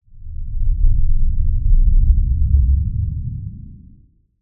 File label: Cinema, Whooshes